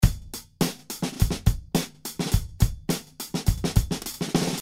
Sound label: Drums; Lucas Fox